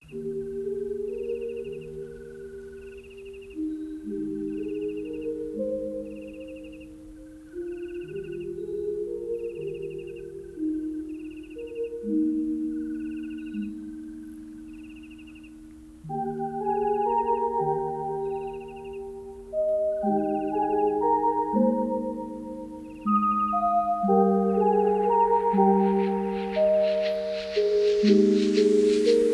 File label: lofi hip hop